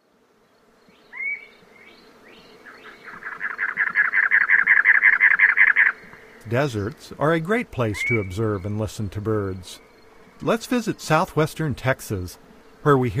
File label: Cactus Wren; North America; Common Western Species; Know Your Bird Sounds